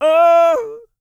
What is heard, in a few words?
SING, World Voices, CARIBBEAN; Spectrasonics Vocal Planet CD5